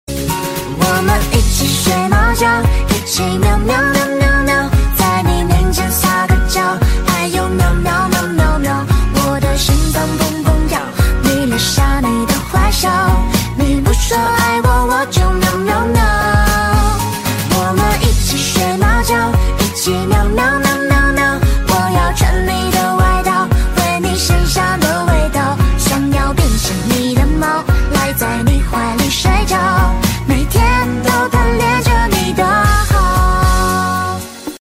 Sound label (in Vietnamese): nhac chuong hoc meo keu